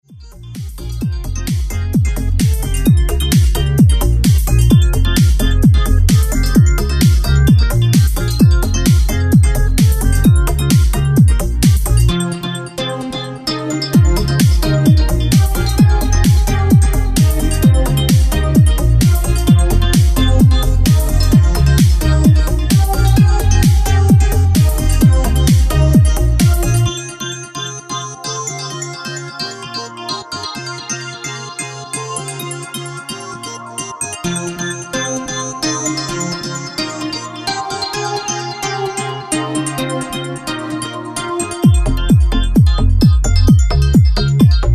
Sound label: dance music